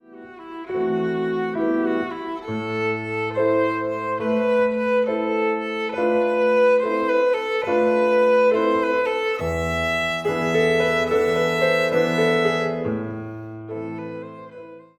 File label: VIOLIN